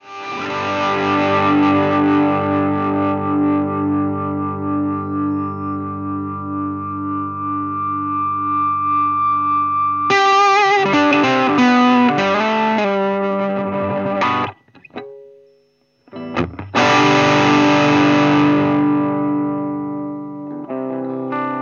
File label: con booster vol chitarra